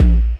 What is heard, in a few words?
Jump, kicks